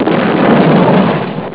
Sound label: explod; ST-XX Amiga Soundtracker Sample Packs